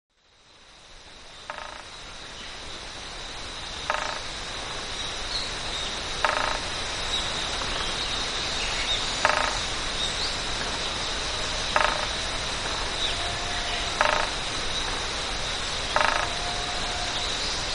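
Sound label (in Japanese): kogera, Japanese pygmy woodpecker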